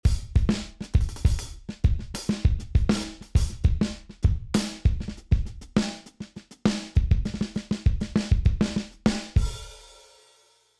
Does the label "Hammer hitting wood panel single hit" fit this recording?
No